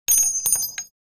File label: cart